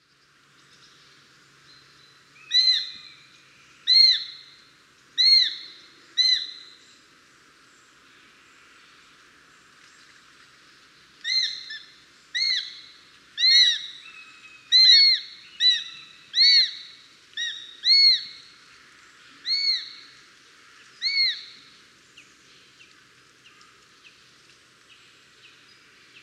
sperwer

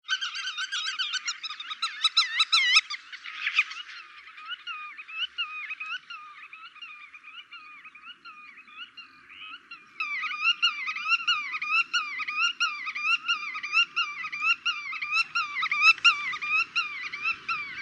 grutto

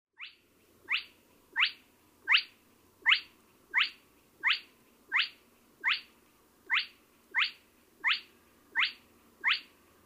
porseleinhoen

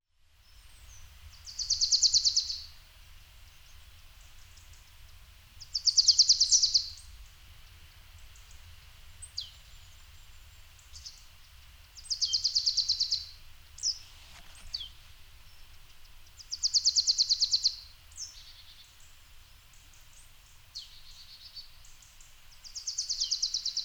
glanskop, vogels

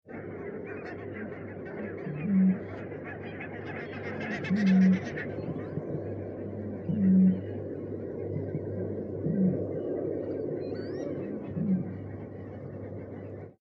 roerdomp

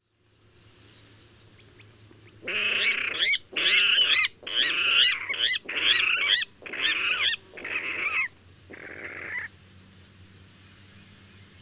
waterral

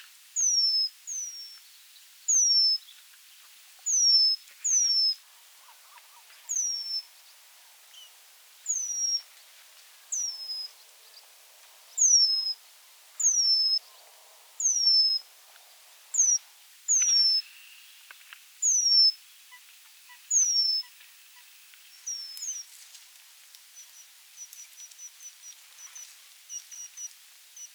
buidelmees

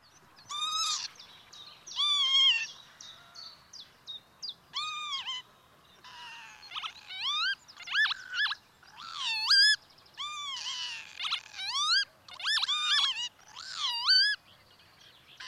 kievit

